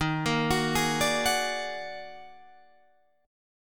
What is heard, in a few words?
Eb Minor Major 11th, Guitar, Drop D